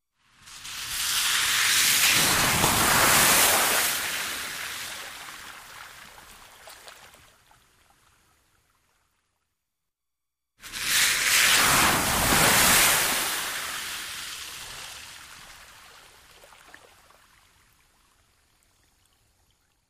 Puddle Bys; Drive Through Deep Puddle, Sloshing Water After Car Has Passed. Medium Perspective. Pass Bys.; wet traffic